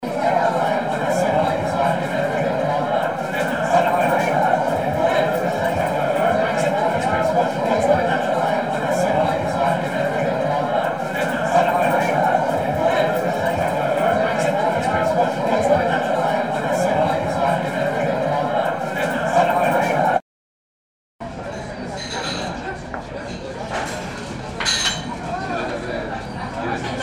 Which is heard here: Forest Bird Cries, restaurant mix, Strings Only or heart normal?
restaurant mix